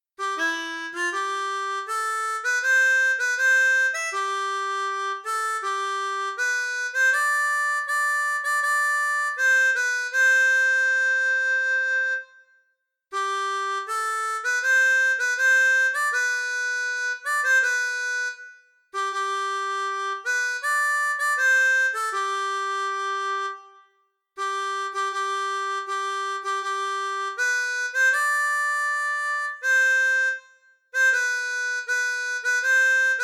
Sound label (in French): Hymne belge, harmonica